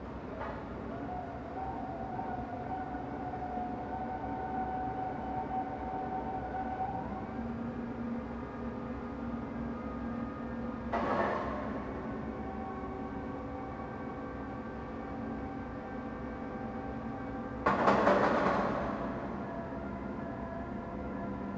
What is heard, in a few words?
Machine